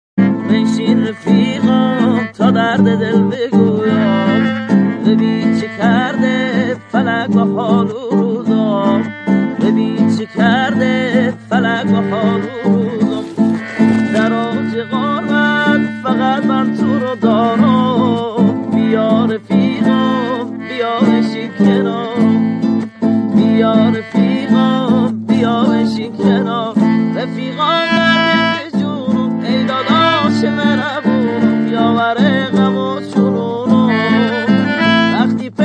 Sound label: Guitar